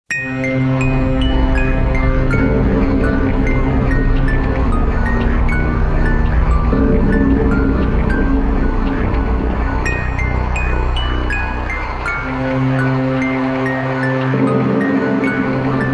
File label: Musick Box